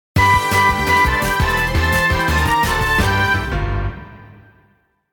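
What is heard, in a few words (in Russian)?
счастливый и расслабленный ритм перехода аудио материала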